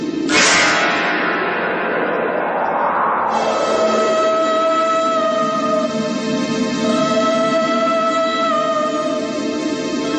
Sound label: Horror Show Ringtone; ringtones